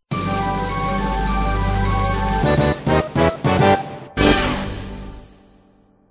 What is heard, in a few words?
oh well music